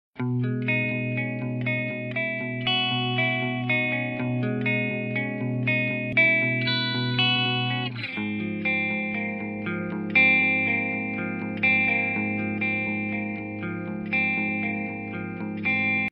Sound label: Music Drama Guitar B 120 BPM; Hello Neighbor unused